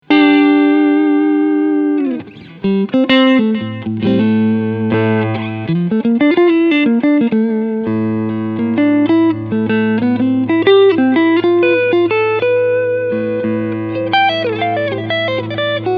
Hals SC Clean Lick

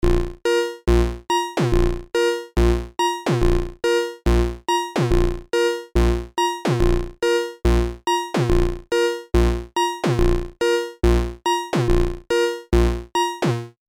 Moog ohne Replicator; T-REX Replicator Module

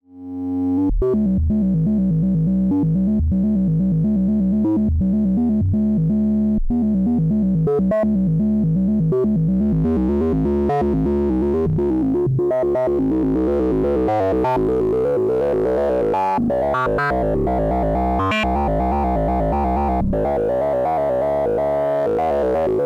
Wavefolder Vergleich Doepfer A-137-1 Multiples dann Folding Level dann Harmonics

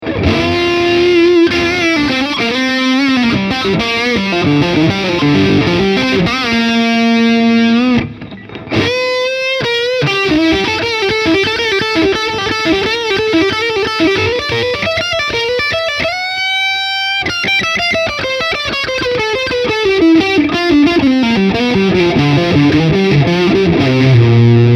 Yamaha Pacifica Standard Plus verzerrt Steg Humbucker

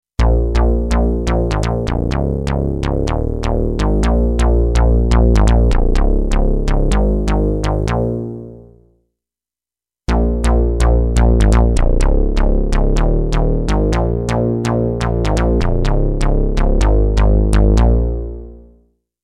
Tele 2x Tiefpass

